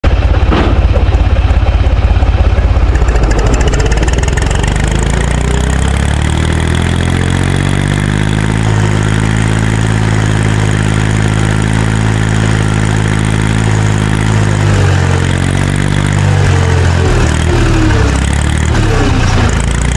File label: Engine sound while driving (Ursus-C360)